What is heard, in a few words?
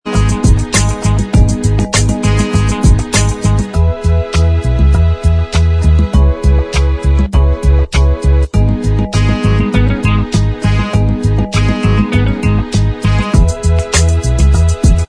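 instrumental, backing tracks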